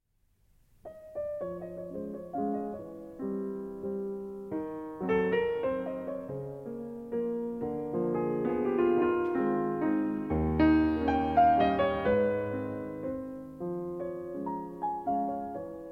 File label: Waltzes, A-Flat Major; Op. 69 No. 1; Farewell Waltz